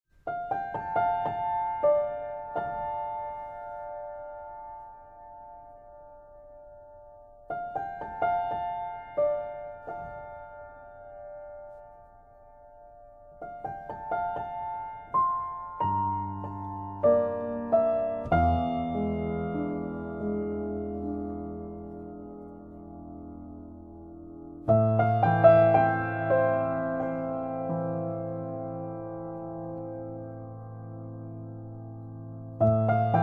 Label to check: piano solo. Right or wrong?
Right